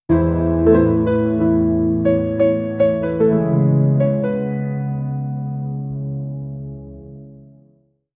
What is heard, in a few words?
warm emotional cartoon, soft piano